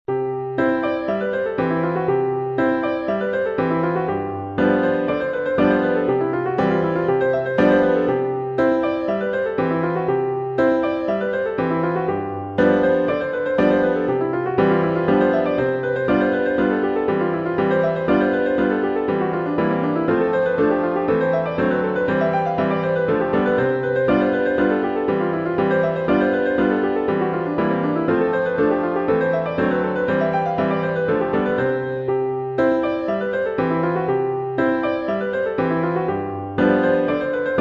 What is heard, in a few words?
piano miniature